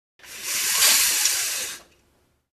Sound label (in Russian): zvuk zhaliuzi